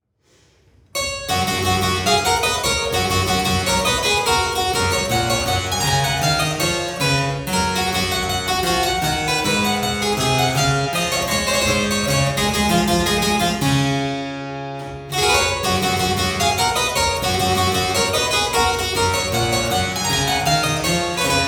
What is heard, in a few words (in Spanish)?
Marcha; sol M